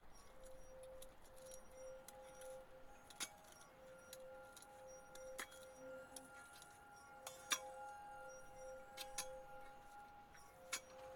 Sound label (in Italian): AMB Cristallo; BLIND QUEST - The Enchanted Castle